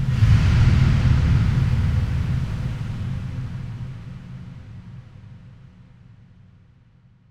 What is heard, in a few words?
Huge Verb Tail, Long Reverb Tails; impact samples